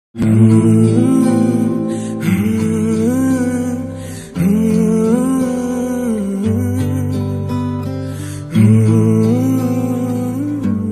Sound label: BGM Humming Ringtone